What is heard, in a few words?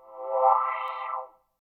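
synth electro 140 A; Techno; Keys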